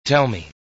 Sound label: arrow